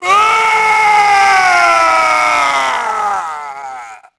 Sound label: merc fire death; enemy; Max Payne